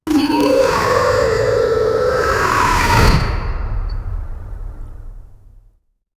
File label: orc attack grunt